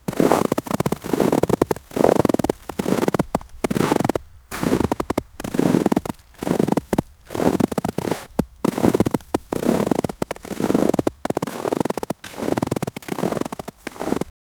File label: the crunch of snow under